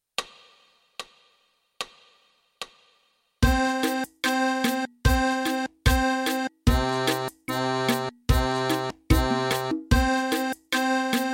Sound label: Semiminime, Fisarmonica, Mano Destra